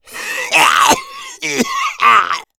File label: smoker lurk, zombie; Left 4 Fortress